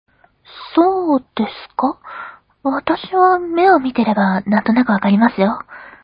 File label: rock